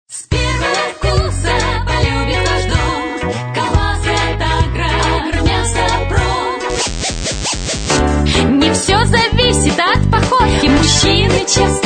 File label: vocal; Vologda